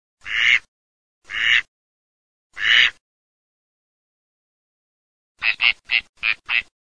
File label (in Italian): CANAPIGLIA